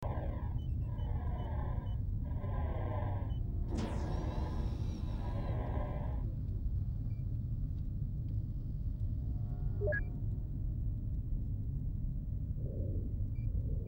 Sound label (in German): raumstation rauschen piepsen